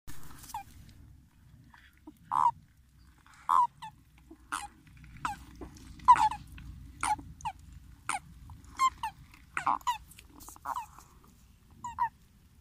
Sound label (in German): kroeten